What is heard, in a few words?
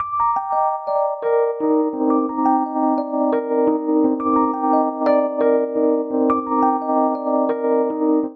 Ostinato Arpeggiated Descending 3/4 C Minor9 86 bpm, Electric Keyboards; MIDI Clips; Ableton; Factory Packs